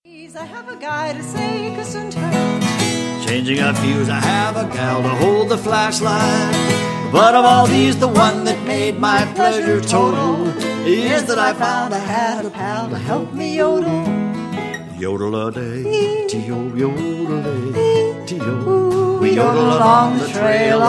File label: Double Yodel